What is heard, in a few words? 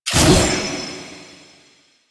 Glaive Throw; Warframe; Teshin; Weapons